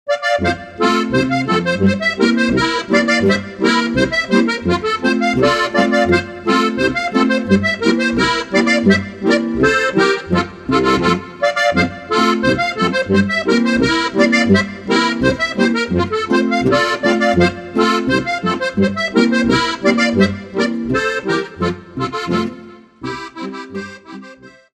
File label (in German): boarischer